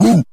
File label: "woo", male